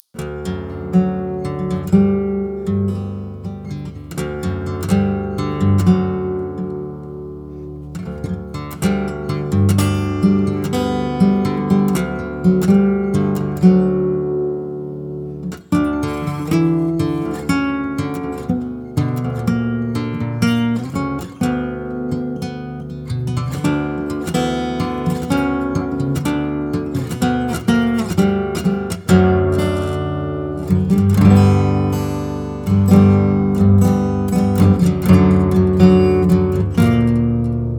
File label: ionian augmented